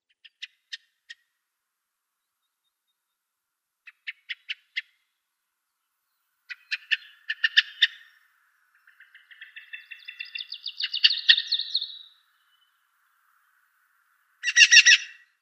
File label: Eyebrowed Thrush calls